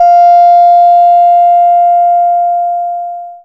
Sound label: sound, electric, guitar